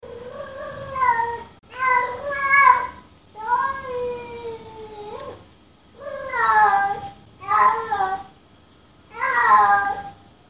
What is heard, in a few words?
CAT HEAT